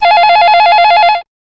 telephone

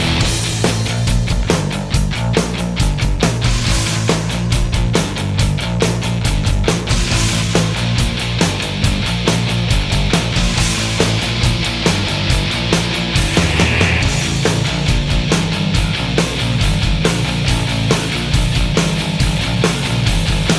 Combat music; POP

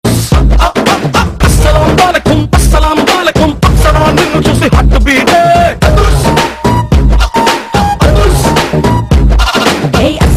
Trailer Songs; Adhurs

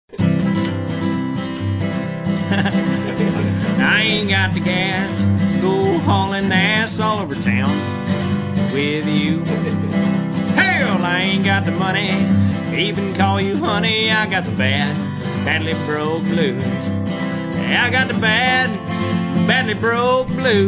Blues

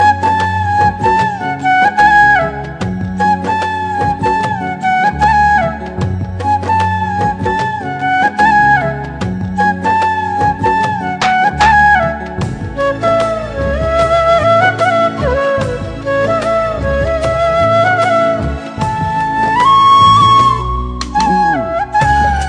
Bansuri

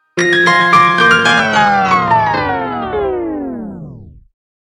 Battery Down

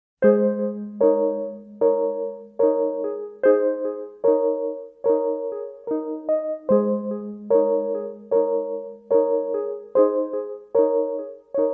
Cute Piano

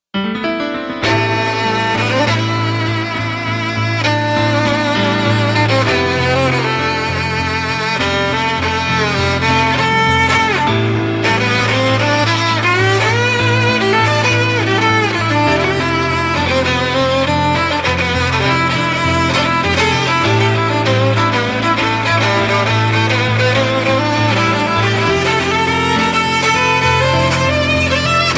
Instrumental; meloboom

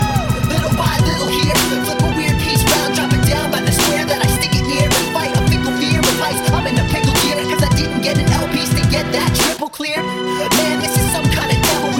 Rap; Tetris

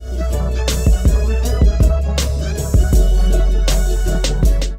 text tone

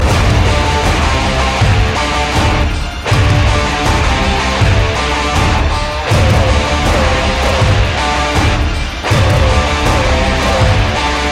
Notify

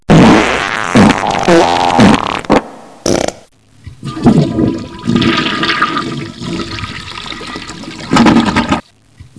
Success

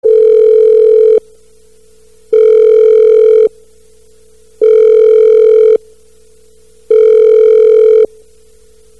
Old Telephone

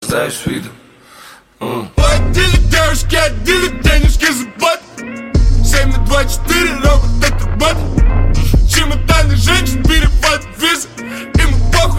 Russian Song

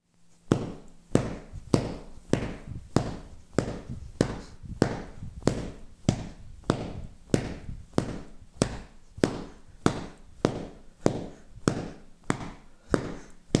Fireworks

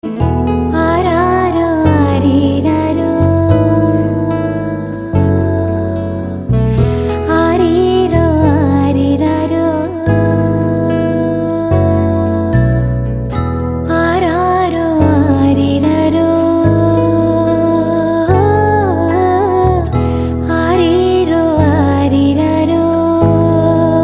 Sms Bgm

